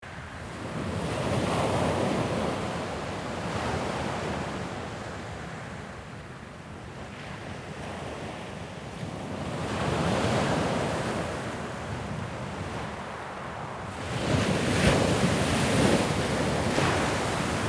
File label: Beach ambience waves middle distance perspective